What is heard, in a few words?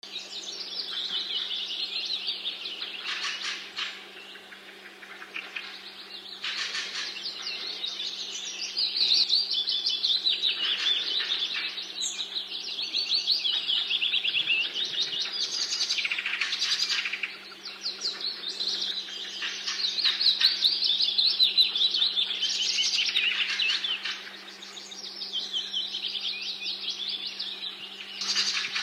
atmo birds exotic mix